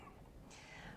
Birds; University Place; Why Birds Sing; RX 9 De-click

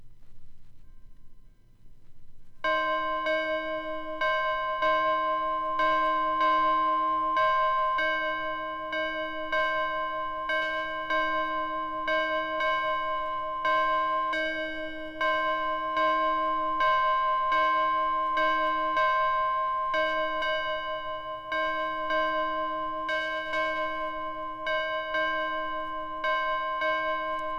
School Bell; Electa Quinney

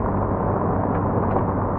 Car Road Noise; Poetry Project

